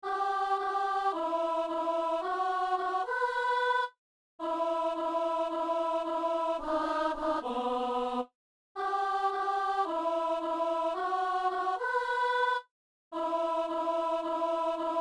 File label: alto; Messe du Frat - Kyrie